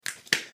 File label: snap and clap